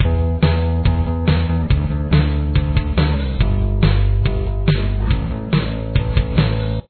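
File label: chorus bass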